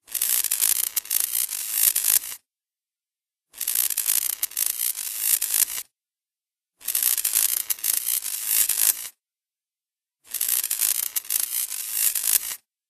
electric sizzle

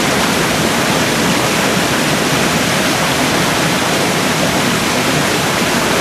water falling

